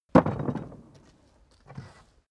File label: carriage hatch single soft